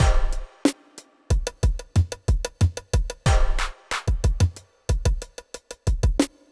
deepbeat 92

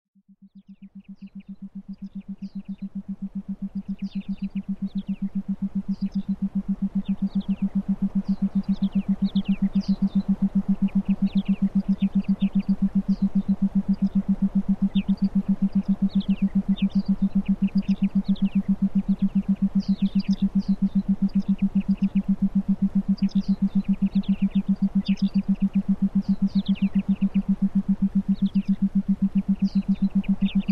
Binaural Beats Theta Meditation Iso Forest

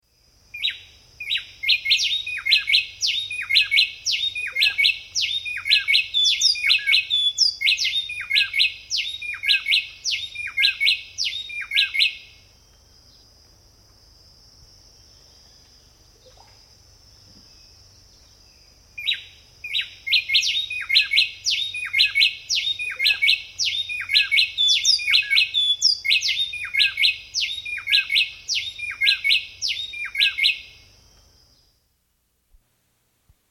Bay Wren; S. America

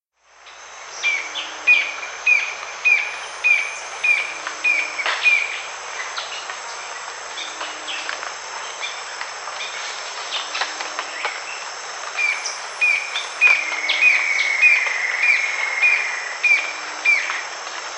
Yellow-Throated Antwren, S. America

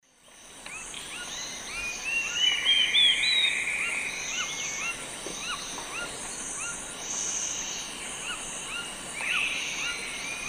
Sittasomus griseicapillus, song; S. America; Eastern Lowlands

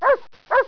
DOG, animal

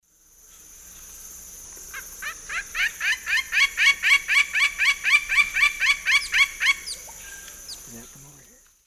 Dryocopus lineatus, call; S. America; Eastern Lowlands

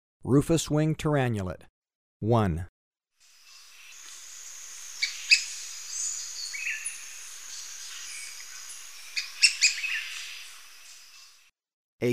Rufous-winged Tyrannulet; S. America

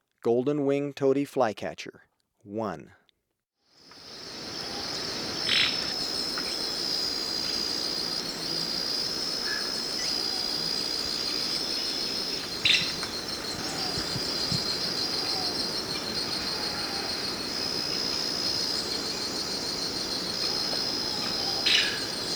Golden-winged Tody-Flycatcher, Birds of Ecuador; S. America

